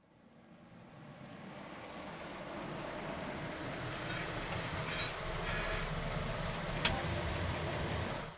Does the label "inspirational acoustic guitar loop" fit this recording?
No